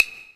JV KIT; Pro-Rec - Synthsonic; JV ANALOG2

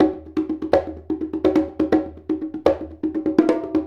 Congas; Essential Percussion

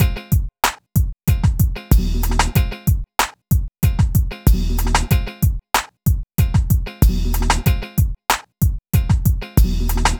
Drums, Hip Hop

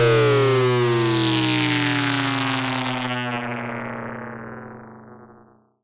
FX, SCI Prophet-5 synth

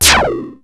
REZO KIT; Rubber Chicken Software Sample Bank

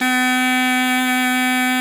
KORT C 3, kortholt; SoundBytes - Early Patches

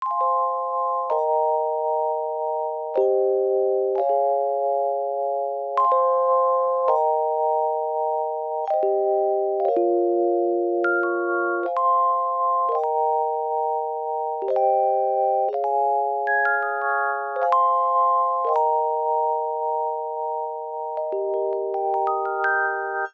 rhodes A, Smooth RnB, Fmin

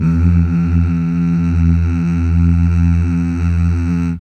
MMH

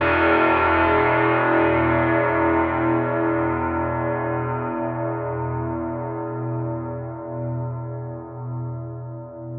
100 E Drone Warehouse; Zero-G - Future Wave; Drones

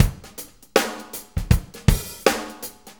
Shuffle Loop, Full Kit Loops; Drum Tools; Shuffles; Sonic Foundry (Sony Creative Software); Loops & Samples Drum Tools

